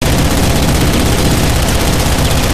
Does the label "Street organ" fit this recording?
No